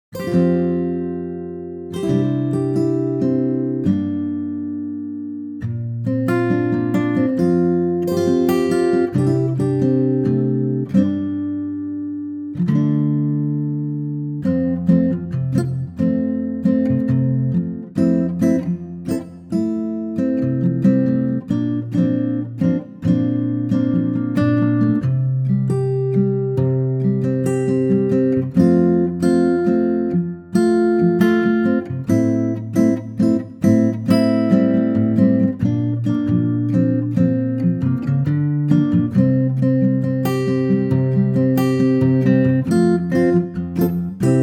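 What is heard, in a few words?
F Ac Guitar, male; key - F - vocal range - F to D; Lovely acoustic guitar only arrangement; intimate 8's feel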